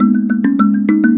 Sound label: Mbira Low Echo; Melodic; Ambient-Melodics-II